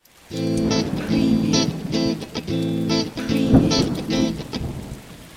4-4 Am Clean 110BPM, electric guitar rhythm; beatcraftpro

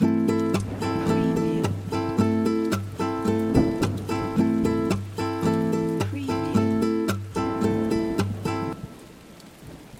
Guitar 2-4 110BPM Am, nylon guitar; beatcraftpro

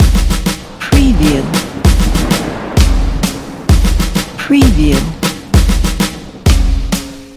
tabl, muharram; muharram loop collection

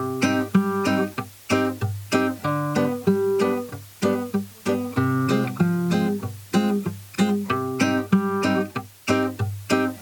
4 stacc, nylon, guitar

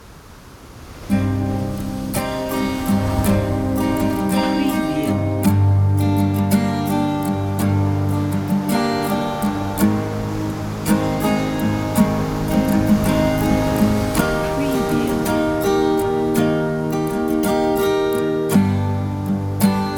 acoustic 6 8 slow, Guitar

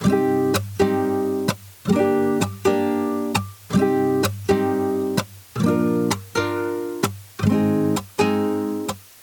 nylon, Guitar; 4-4 shekaste

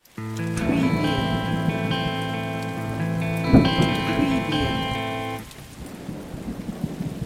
ARP 6-8 Slowrock Am 115bpm Clean, electric guitar; beatcraftpro

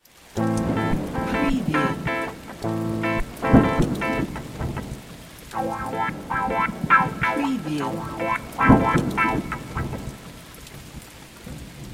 RTM Bastaki Am 105BPM, electric guitar; 6-8; beatcraftpro

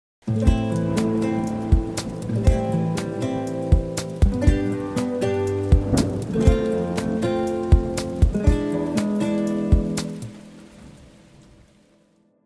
4-4 arp, nylon

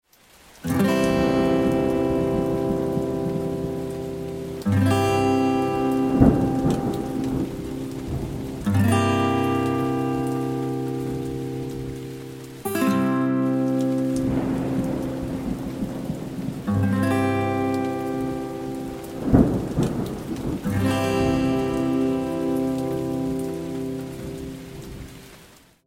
strum acoustic guitar

